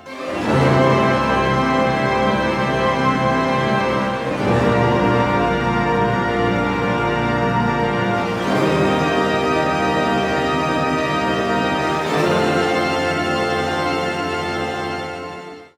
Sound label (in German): Orchester Auftakt Jingle